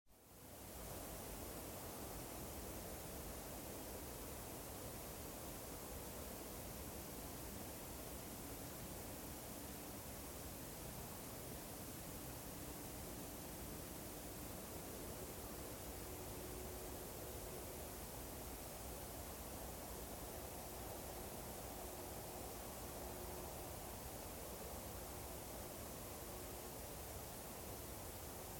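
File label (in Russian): тихий звук листвы; Звук ветра в лесу; звук ветра в листве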